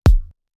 bassdrum

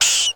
Transformation

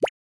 Character Jump; starbites

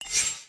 UI Menu UI ONESHOT

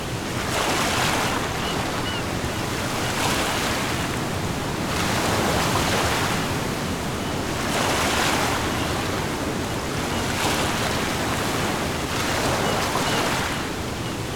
Beach Ambient Loop

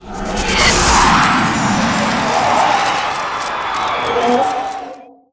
Music Frontend

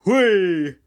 Cheer; Hollow Knight; Grub King Cheer

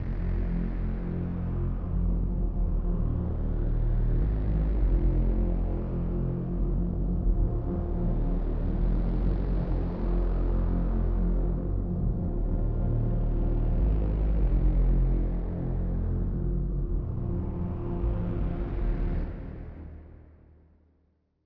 Sub Loop; Gaiacres

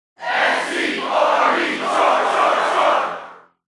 Sfx Americanfootball

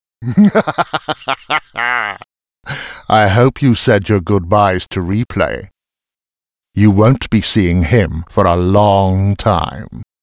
Outro Fail US; arthur and the revenge of maltazard; wii

